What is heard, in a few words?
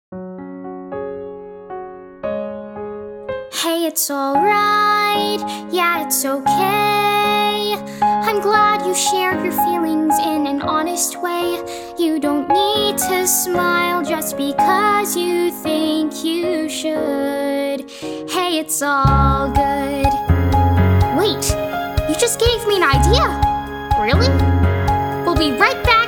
VOCAL